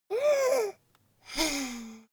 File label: child breathe deep hard